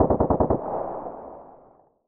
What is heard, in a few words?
scarh burst long; scar heavy; weapons; SPT Battle Ambience